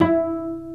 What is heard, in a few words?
CELLO PIZZ; Greytsounds VOL-1